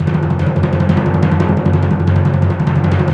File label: PRC TIMPRO, Timp Roll; Roland LCDP03; Timp Rol Dry